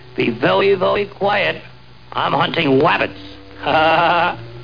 HUNTING

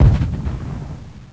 Tank Fire; Speed Bump

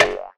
bat hit; Bricks Of Egypt

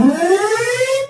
Warning; balloon rain

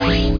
power; MOTS

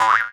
tick red; Dino and Aliens

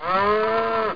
cow

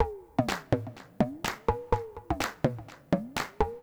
HOUSE 125; eJay

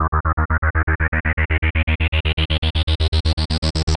TECHNO, Rythme

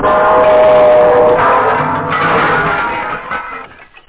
drop; da capo vol1

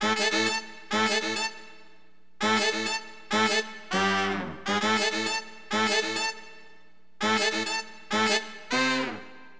ACID HRN, Acid Jazz Funk, Wind; LiveAct Pool

